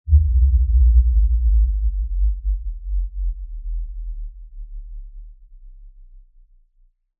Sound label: LFE Impact Descend; Production Element, Imaging Element, Accent, Transition